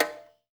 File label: ZULU, ETHNIC DRUMS; Spectrasonics; Liquid Grooves 1 & 2